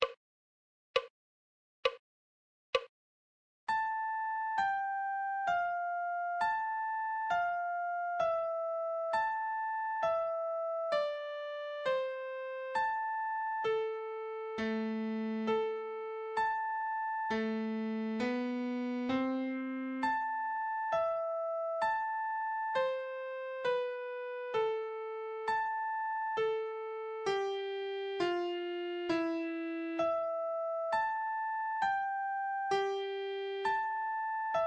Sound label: musical reading in treble clef Adagio